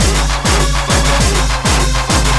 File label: drumloop; awe32